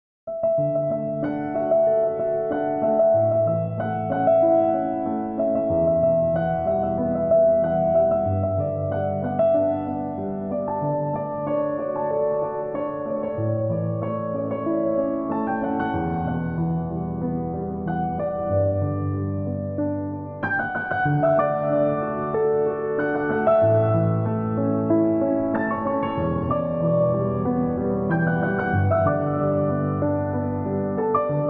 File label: piano instrumental